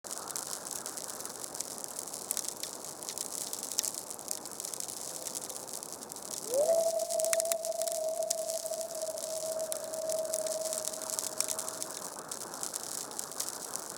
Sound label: vlf